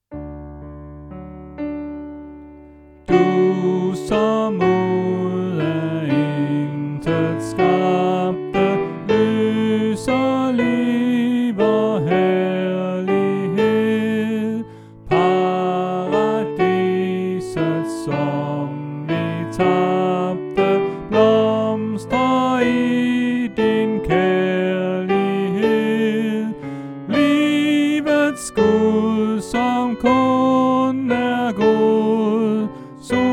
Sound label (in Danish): Sopran; oevefiler